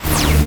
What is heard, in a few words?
laser; sentry